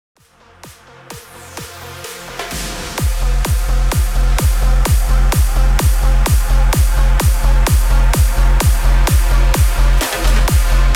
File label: BIG BEAT VIP CUT 128 BPM